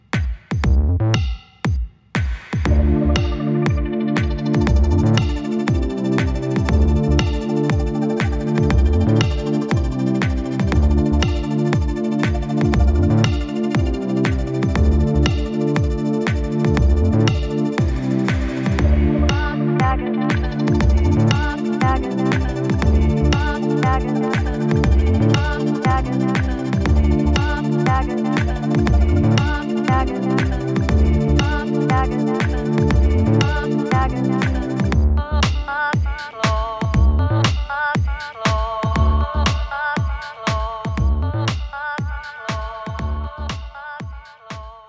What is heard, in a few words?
audience walk-in music